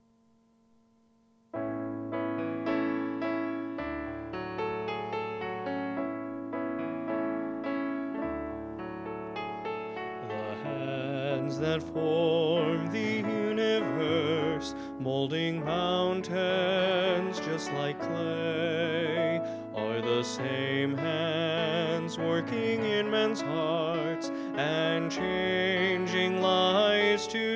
duet

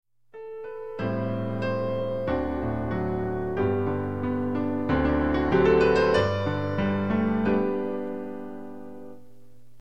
Runs in Hymns example

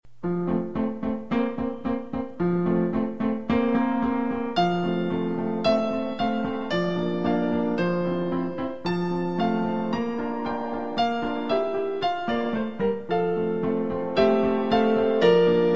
Joyful Piano Praises

